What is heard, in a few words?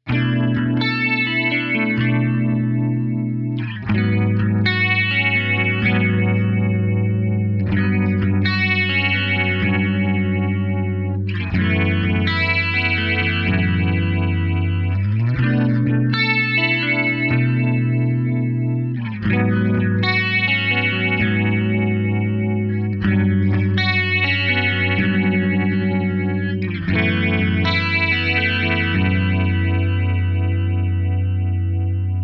ola steady vibrato